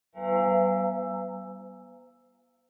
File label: Horror Accent Low Short